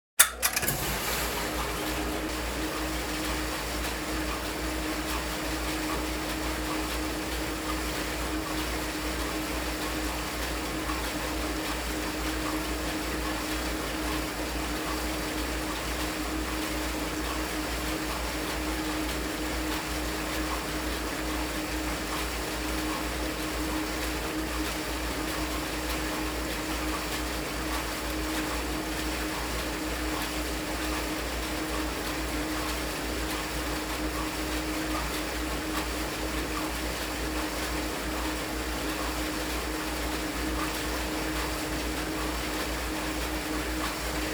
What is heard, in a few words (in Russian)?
Posudomoechnaya mashina